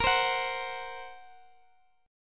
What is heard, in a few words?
A sharp Suspended 2nd Sharp 5th A sharp, Guitar, Open D